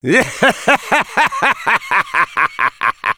Sniper laugh long, fr; (Rire gras 2); Réponses du Sniper

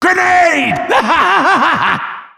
grenades

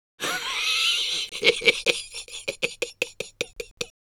Soldier laugh long, es

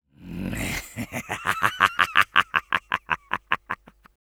laugh evil, fr; (Rire sadique 3)

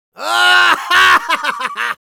laugh happy, es; Risa feliz; Demoman

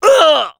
pain severe, fr; Spy; Douleur intense 5

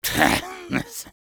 laugh short, fr; Soldier

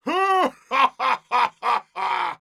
laughter big, es; Heavy; Risa grande

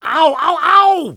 taunt head pain; «Ау ау ау!»; Насмешки шпиона

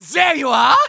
flip exert; Medic; taunt

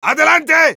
battlecry, es; ¡Adelante!; Medic

